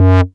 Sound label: Bass Multi Rev F#; Vengeance Samples